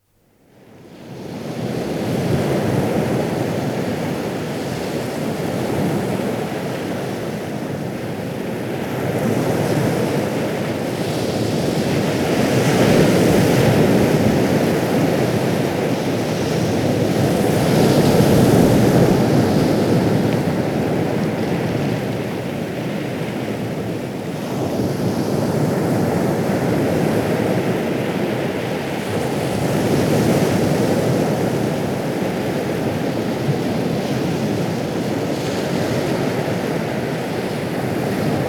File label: Out To Sea; Dr. Sound Effects; 210 Nature Sounds - 20 Hours of Relaxing Natural Ambiences for Meditation and Sleep